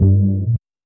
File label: hum